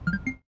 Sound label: classic connect, computer sounds